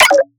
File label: synth hit Computing Noises; Virtual Riot